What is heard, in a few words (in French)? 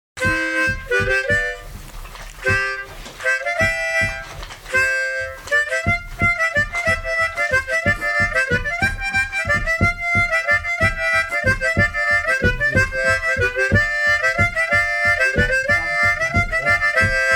Mazurka